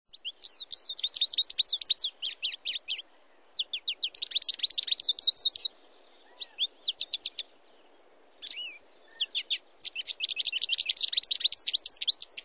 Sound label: goldfinch